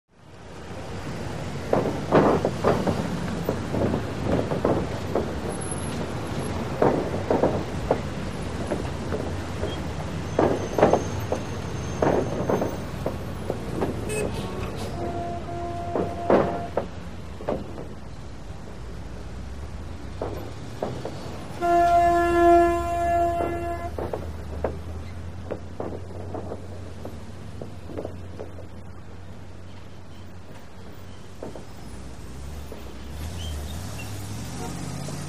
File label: Traffic Bys Cl Metal; car & truck bys